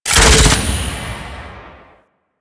Fortress Mine Explode; Ambience; Warframe; Grineer Fortress

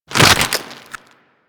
Grapple Retract; Warframe; Infested; Ancient; Attacks

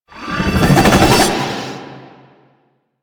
Vortex Ability Charge A; Warframe; Duviri; Duviri Dax; Abilities; Ashigaru

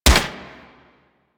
Swarm Rifle Shot; Warframe; Infested; Shock Swarm Rifle

